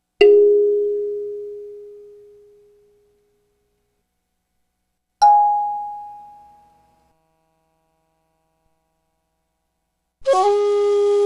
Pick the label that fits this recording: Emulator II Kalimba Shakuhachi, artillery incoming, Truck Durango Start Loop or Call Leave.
Emulator II Kalimba Shakuhachi